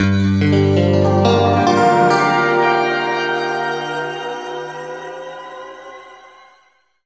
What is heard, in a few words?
G P-S, guitar chords; East West; Steve Stevens Guitar Samples Collection